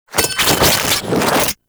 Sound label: Grenade Prep; Warframe; Lasrian; Lasrian Soldiers; Weapons; Lasrian Goonadier Grenade